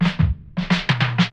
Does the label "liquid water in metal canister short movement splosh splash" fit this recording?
No